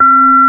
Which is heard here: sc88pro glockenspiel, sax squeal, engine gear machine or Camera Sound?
sc88pro glockenspiel